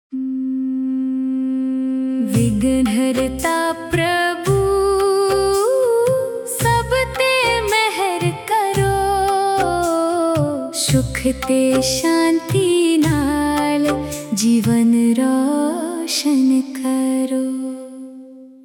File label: punjabi, ringtone; ganesh